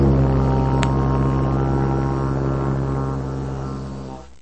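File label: didgeridoo single noisy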